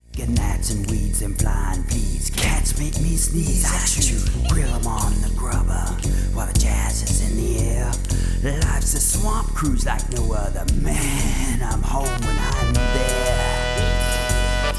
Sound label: Squiggly Wiggly Song